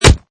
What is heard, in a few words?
Kick, Heavy kick impact with body or other object; Punches and Hits; Combat